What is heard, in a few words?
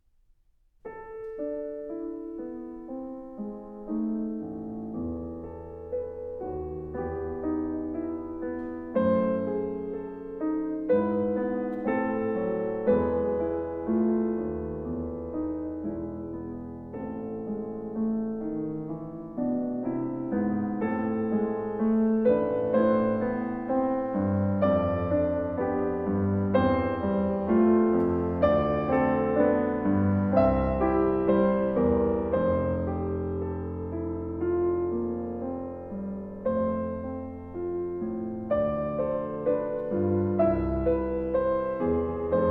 Orgelbüchlein Nun komm der Heiden Heiland BWV 599 Arr. For Piano Four Ha; Piano Four Hands